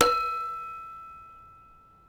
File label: pole hit; hawaii